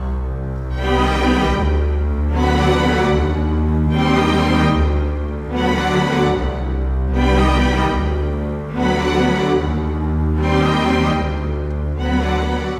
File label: Cinematic Strings, Orchestral